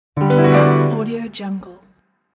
cartoon piano accent

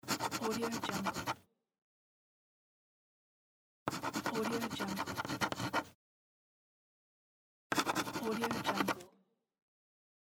pen writing

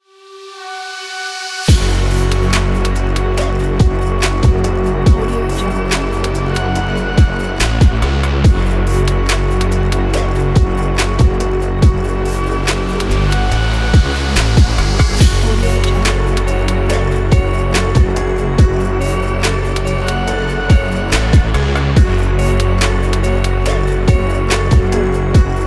dubstep experimental

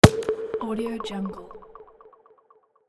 atmospheric air click; soundmasterfx

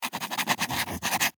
handwriting fountain pen

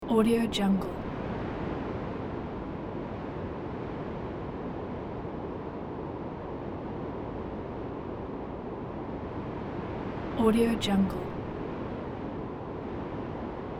desert wind low loop; magicsoundeffects

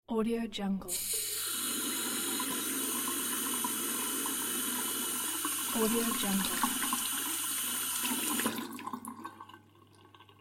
sink

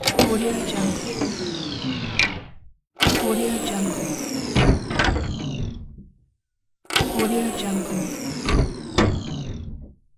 spaceship pneumatic door pack

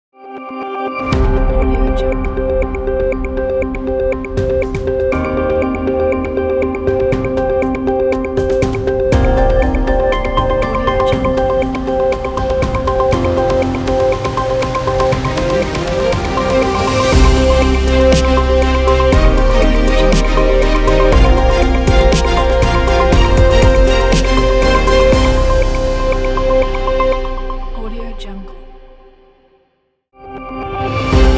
news intro opener logo

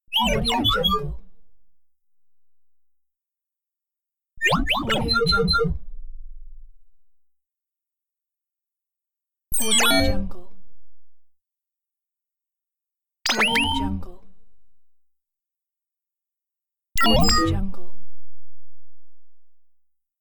scifi robotics high tech; efxideas

